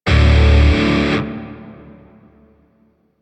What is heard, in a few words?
Shawzin Chromatic Chord C; Grineer; DE; Warframe